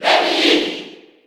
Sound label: Cheer; Lemmy Koopa; JP; SSB4